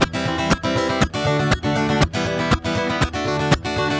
GUITAR SALSA

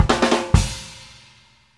FILL END; PlatinumPhattCD1; E-MU Producer Series Vol. 8 – Platinum Phatt; Grooves Filz Hitz

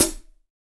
Hat, Drum & Bass

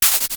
NOIZ MOD, Dual-Percussion-Synthesiser; Klone; KLONE FX; Drum Machines

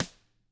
TAMA SNRD; ILIO; Double Platinum Drums 1

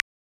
Dry click, Univox SR-95

